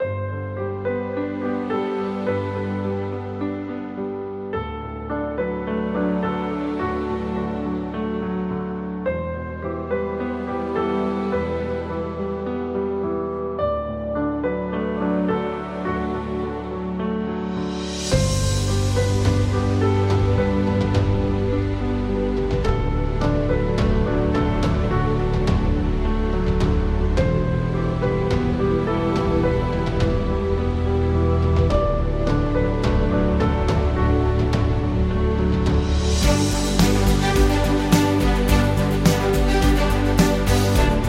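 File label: Love Music, Kids background music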